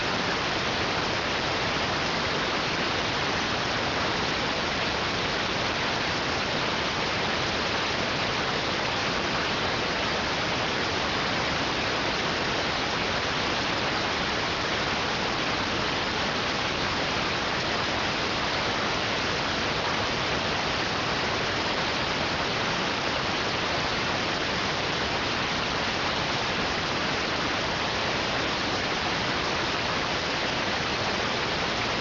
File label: rtty rx